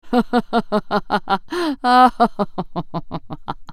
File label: laugh; MetalWingedJudicator; Character Voices - English